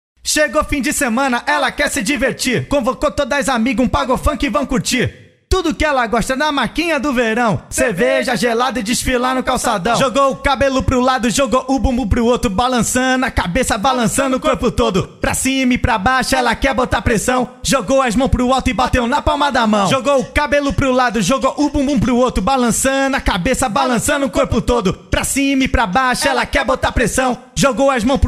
acapellas funk

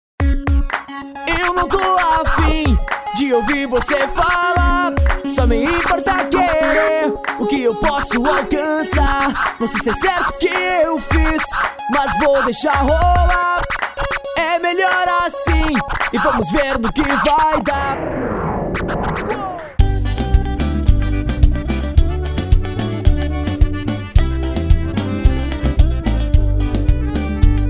demo song; estudio sigma

